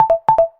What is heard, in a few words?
Range Low Alert; GPSMAP H1